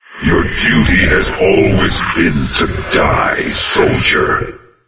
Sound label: taunt; doom3; sabaoth